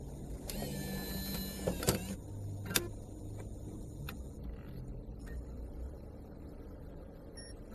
Inserting A Disk

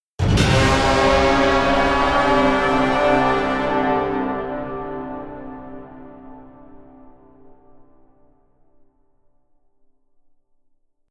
War Horn Blast

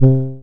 Simple Analog Lead

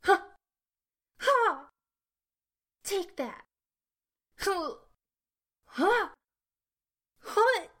Fighting Sounds

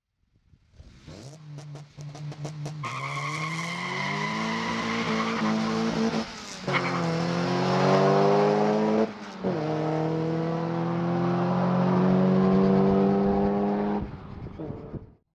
Car Acceleration

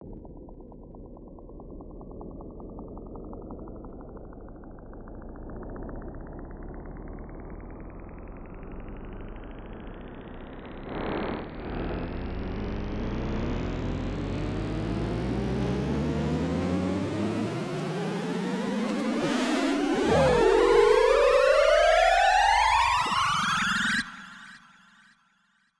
Riser synth

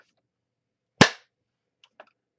High Five

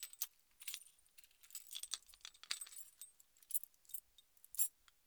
Car Keys

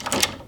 Door Handle Close

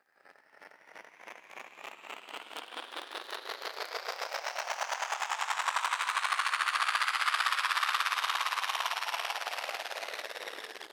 Whoosh

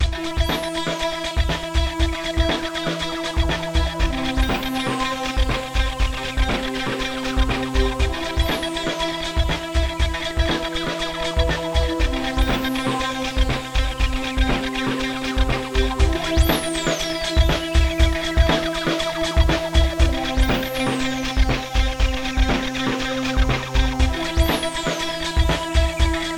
Odd Stratocaster Guitar Loop

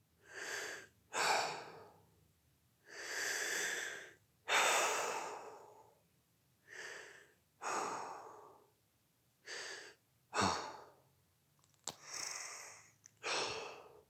Male Sigh

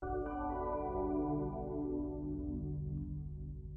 Soft Pad

